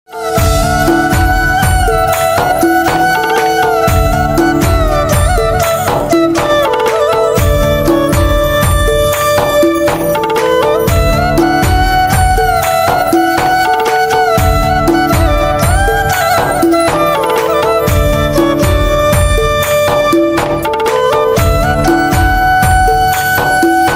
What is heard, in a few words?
Flute Instrumental Ringtone